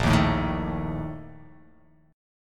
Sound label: Bb Major 7th Suspended 4th, chord; strummed; JGuitar